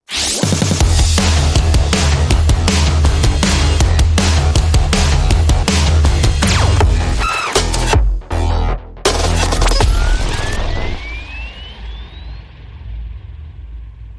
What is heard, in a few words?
Countdown